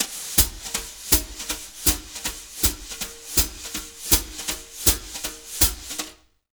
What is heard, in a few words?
Jazz Latin Drumloops; 160 CHABAD; USB Soundscan vol.08; AKAI